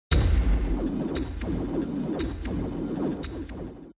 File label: CINEMATIC HIT Electric Punch; smartsound